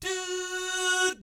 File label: DOO SFZ, Jazz FX; Spectrasonics; Vocal Planet; JAZZCOMBO